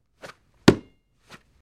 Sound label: Football Handling